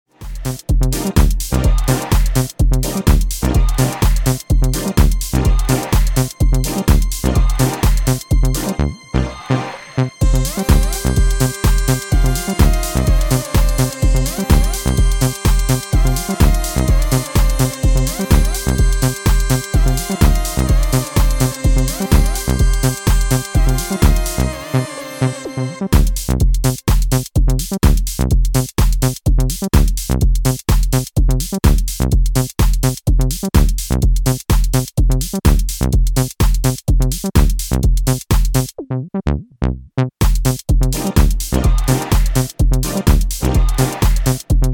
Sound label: Instrumental; house